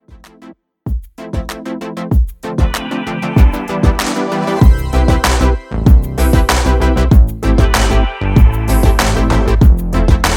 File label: Lounge